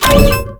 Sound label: Activate Switch, Access Panels; Warframe